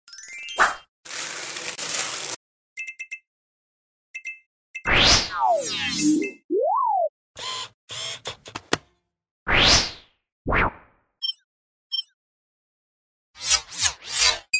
ANIMATION

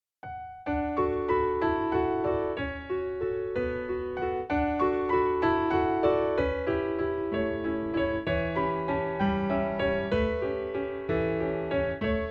PIANO VALSE

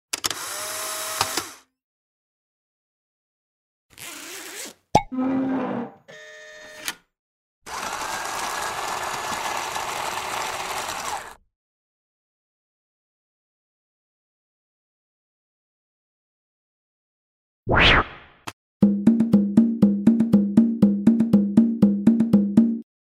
ANIMATION

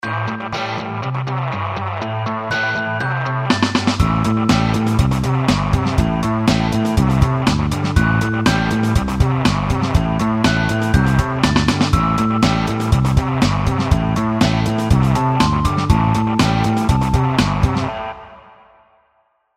FUNNY GUITARE

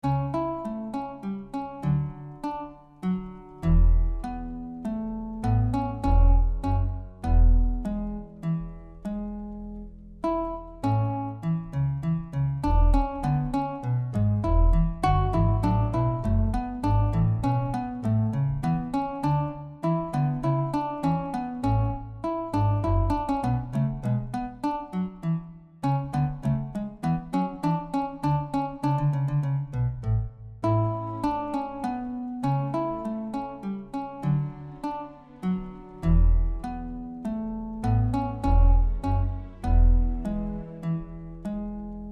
HARPES

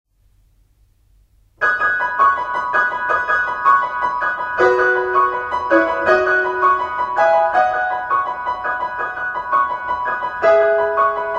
PRELUDE 11 EN SI MAJEUR

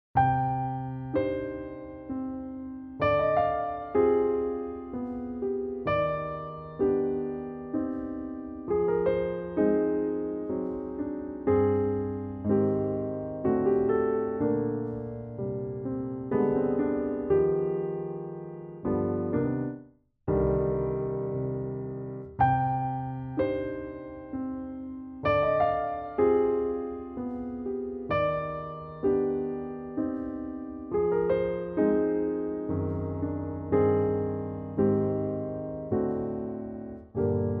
BEAU PIANO TRISTE

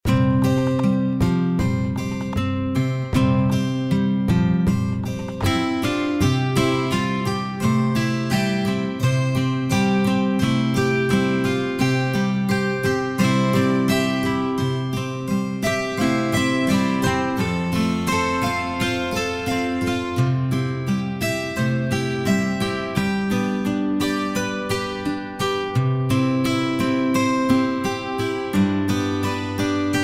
HAWAI GUITARE

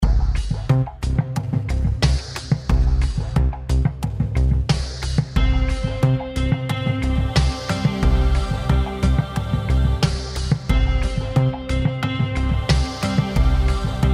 AMBIANCE

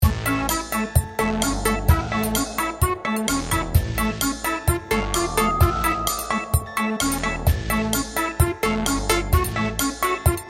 limonaire

